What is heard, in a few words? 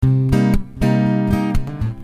Punchy Cm 60